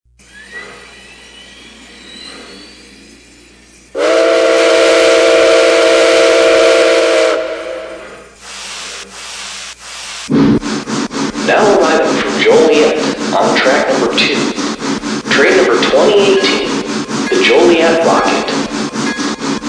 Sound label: Berkshire 765; NKPX